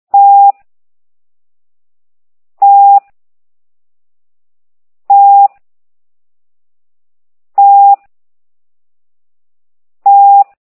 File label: ttttt